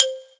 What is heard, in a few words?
Korg TR Rack Xylophone C5